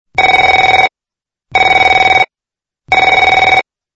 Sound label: phone